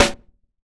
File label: SD, Dance Drums